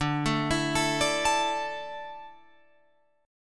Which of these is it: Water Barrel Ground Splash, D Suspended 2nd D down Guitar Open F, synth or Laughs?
D Suspended 2nd D down Guitar Open F